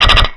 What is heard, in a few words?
attack; aliot